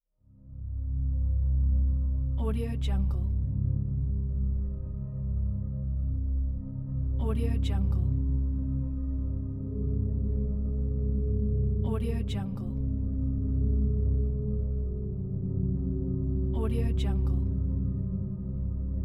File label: ambient drone